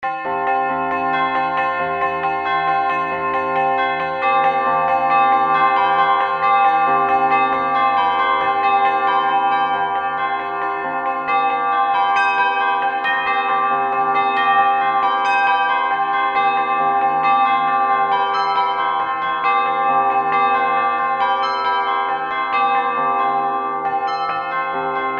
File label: bells; bell tuning compare; blue ji